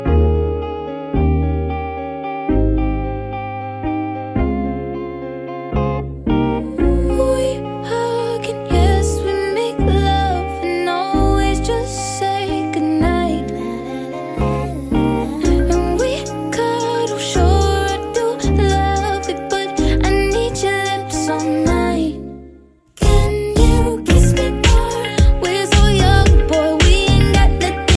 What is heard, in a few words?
music ringtone english ringtones